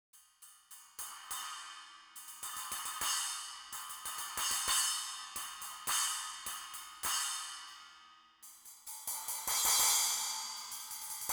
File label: brush splash, drum samples; Brushes on 8 and 9 Inch Splashes; professionally recorded acoustic drums